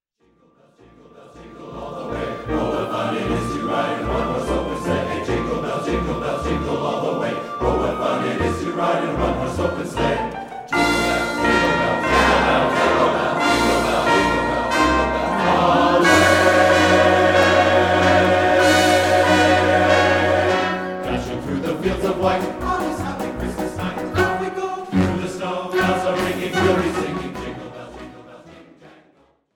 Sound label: Jingle Bells, men's chorus